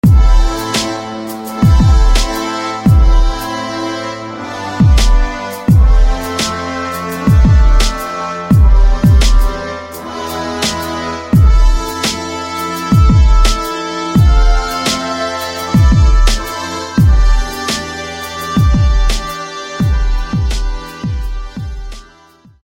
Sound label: Horn Forces; SFB FX